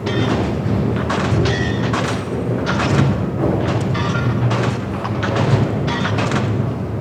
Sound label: mining station signals; Stellaris